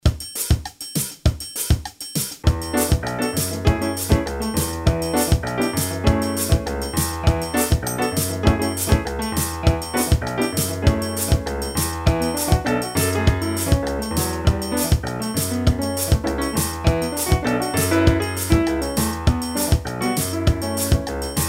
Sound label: piano